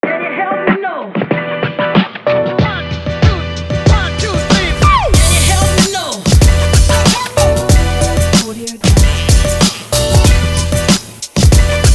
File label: that hip hop